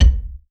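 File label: Wooden Door bass drum, Music Hall Percussion; Aberdeen Music Hall; Drum Hits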